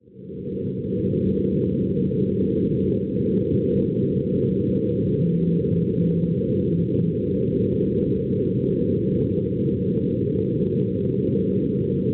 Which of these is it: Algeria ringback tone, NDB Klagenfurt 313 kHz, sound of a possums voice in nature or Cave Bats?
NDB Klagenfurt 313 kHz